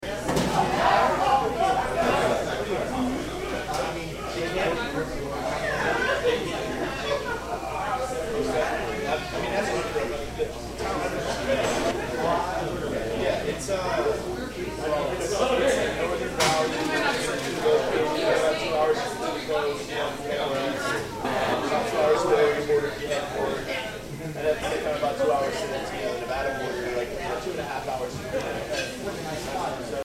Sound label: hotel bar crowd; san jose; fairmont